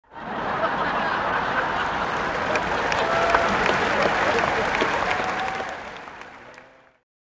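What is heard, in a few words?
applause and laughter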